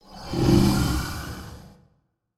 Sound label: growl, alien voice